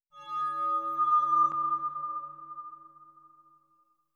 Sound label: Check Point; coldfire keep ios macos windows gamerip 2014